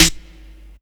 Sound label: SN, 2 Step; USB Soundscan vol.51; House Side Of 2 Step; AKAI